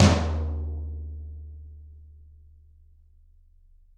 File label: Toms, Drums; Bob Clearmountain Drums I